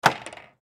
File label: Table Hockey Game, Table Hockey Game Hit Puck Short Rattle